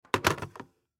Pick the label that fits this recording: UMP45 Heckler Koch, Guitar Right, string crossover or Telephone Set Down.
Telephone Set Down